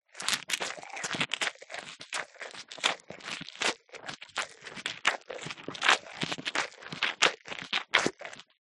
animals cow eat grass or foliage; zapsplat

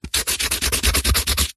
office marker pen on cardboard scribbles

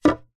wood slat pine set down solid ground; foley

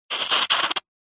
hospital syringe squirt short hard fast squelch

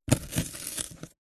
cardboard box cut knife stab twist; zapsplat

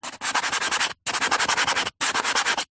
foley cardboard thin strip rub fast table

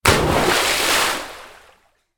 nature water splash child jump into pool; zapsplat

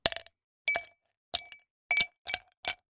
food drink 6 ice cubes drop into glass

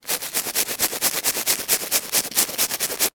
household nail brush scrubbing nails; zapsplat

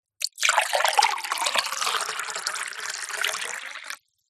drink still pour into tumbler glass; zapsplat

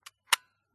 Smena Symbol camera wind lever locked; mkh8060; pmsfx

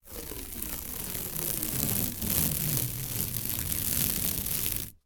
industrial masking tape peel from roll close up

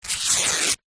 foley clothes running shorts polyester rip

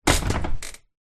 household bed person sits on wooden frame creak

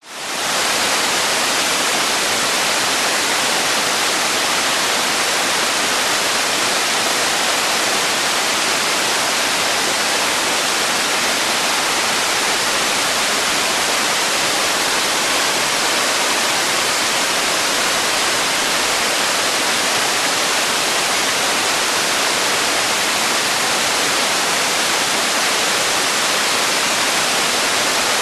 nature waterfall 80m high close to base pool

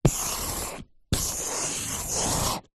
marker draw lines whiteboard; office

